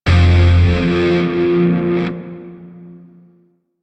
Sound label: Shawzin Hirajoshi Chord F; Grineer; DE; Warframe